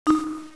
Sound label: DING; Know Your Birds of Prey - Vultures to Falcons